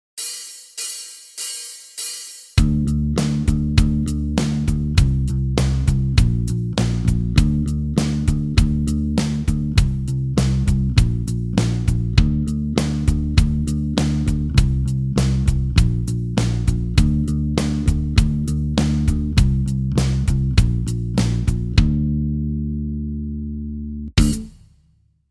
D minor, guitar; total guitar; play guitar now

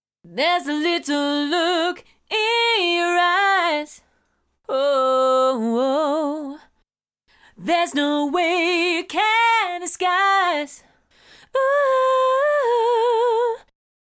dry; Computer Music; cubase

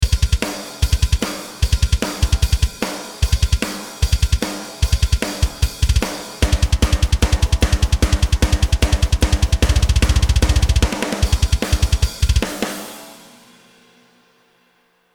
Double bass drums

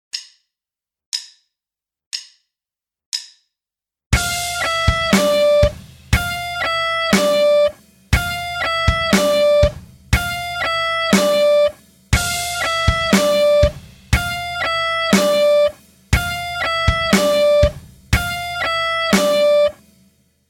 Alt picking, guitar; 30 day guitar challenge

